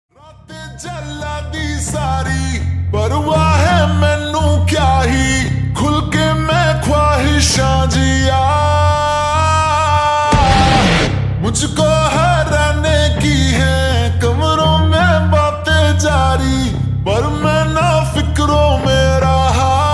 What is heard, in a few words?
Slowed Reverb Ringtone